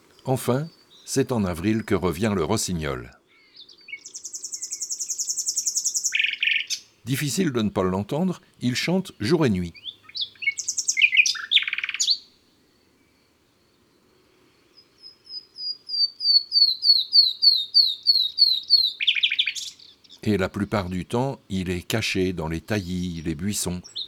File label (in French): Avril Le Rossignol philomele